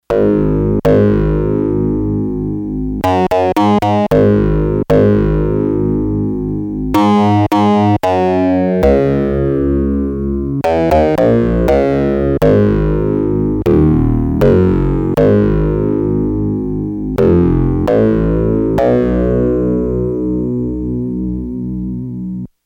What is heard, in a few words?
Owl Bass, Yamaha TX802; Factory Patches; Voices